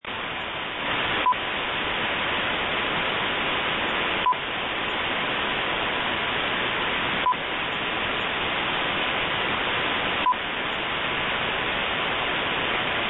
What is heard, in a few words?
Pips